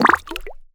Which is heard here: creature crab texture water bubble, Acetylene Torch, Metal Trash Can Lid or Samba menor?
creature crab texture water bubble